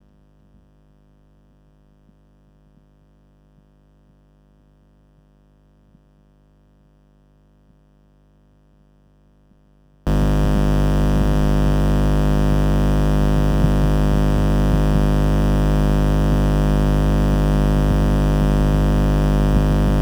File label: speaker